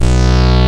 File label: KORG, Rare Synthesizer; Hollywood Edge - Giorgio Moroder Rare Synthesizer Collection